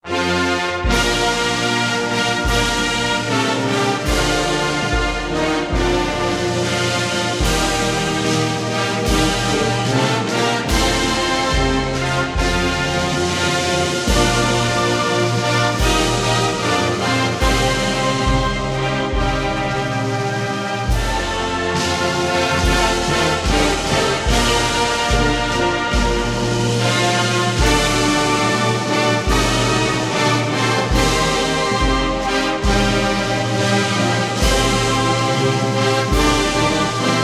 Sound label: National Anthem of the Democratic Peoples Republic of Korea